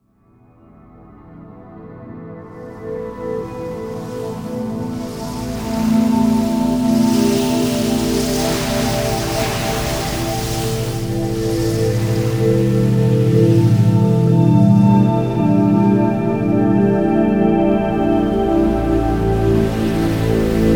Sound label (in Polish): muzyka relaksacyjna